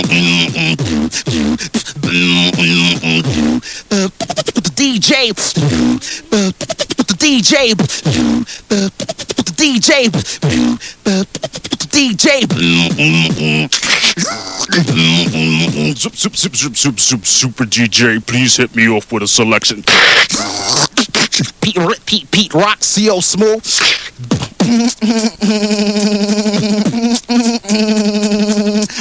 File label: Beat Box